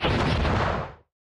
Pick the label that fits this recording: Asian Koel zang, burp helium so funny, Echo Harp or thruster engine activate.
thruster engine activate